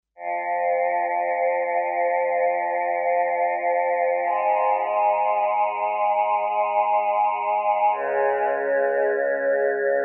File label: Micro Vox, Kawai K5000S factory presets